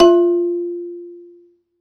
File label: Rubbery Piano, prepared piano; F2; Total Piano